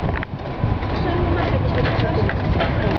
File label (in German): Rolltreppe